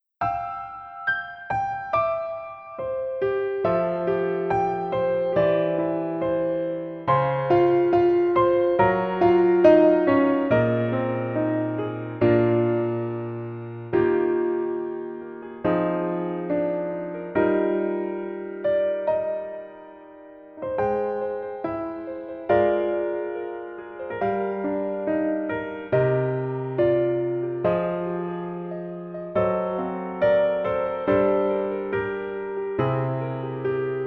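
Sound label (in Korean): Piano version 멜로디MR 여자키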